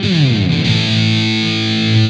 Electric Guitar, Loops; Guitars

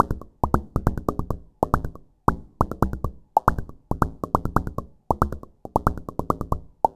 Electronic Percussion

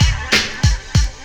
Old School Break, Drums

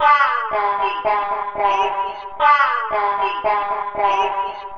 Processed Vocals, Loops

